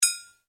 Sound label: BELL BRASS JAPANESE METAL STRIKER, Traditional Japanese bells; Musical